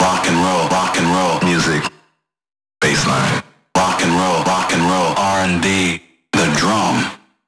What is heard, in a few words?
House Loops, Vocal Loops House 128BPM